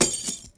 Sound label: Heavy keys dropping